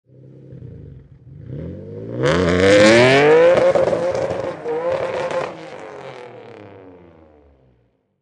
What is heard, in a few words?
Nissan 350Z Exhaust Takeoff, Auto, Power Car, High Performance Car Sound; Nissan 350 Z. Exhaust perspective. Takeoff, Take off, Drive away